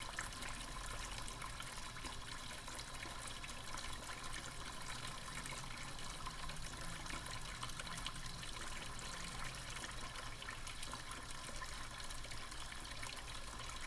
Water Bubbling

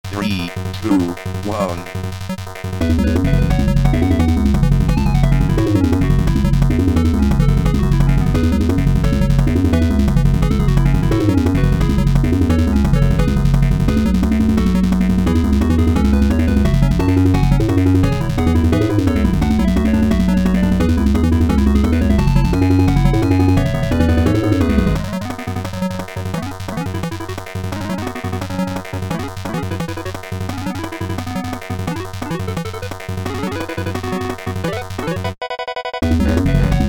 Game Music

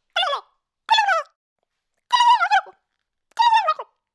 Turkey

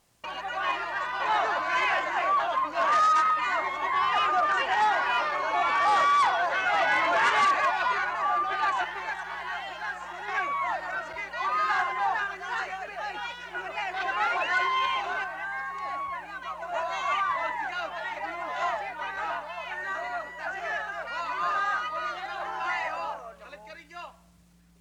Mixed Group Yelling And Screaming

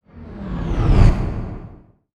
Riser Hit

